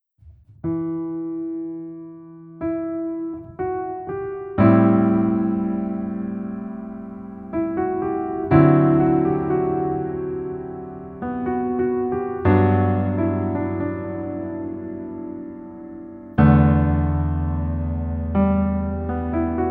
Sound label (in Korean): Piano ver, 멜로디MR